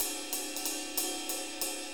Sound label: 6-8 Ride, HiHat & Ride Loops